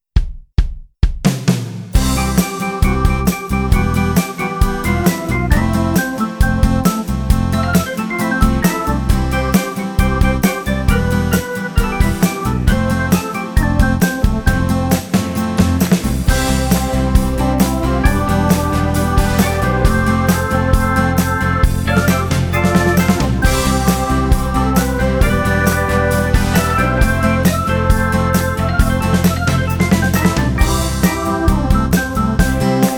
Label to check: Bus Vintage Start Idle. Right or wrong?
Wrong